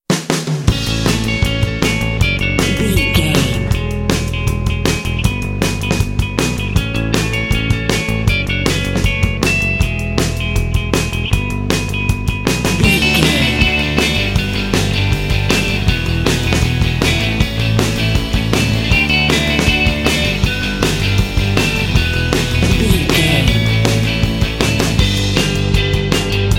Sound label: indie pop